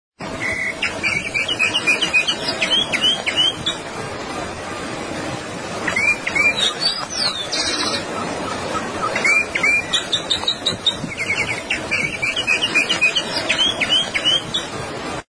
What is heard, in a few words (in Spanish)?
voz calandria grande